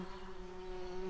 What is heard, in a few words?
bumblebees, Hypnorum workers